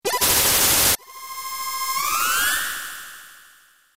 buff protect; ff3; ff3us

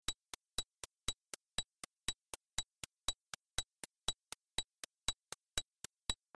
beat; ff3; ff3us

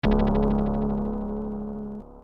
noise blaster; ff3us

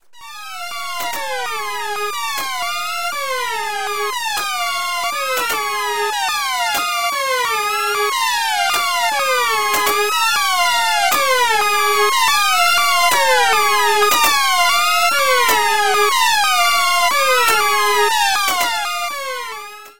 alarm; romancing saga 3

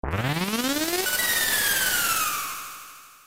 dragon esper; ff3us

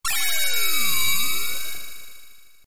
shell; ff3; ff3us

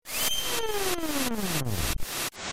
snare; ff3; ff3us

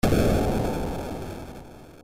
open huge; ff3; ff3us

